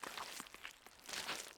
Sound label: foot mud; Inotia 3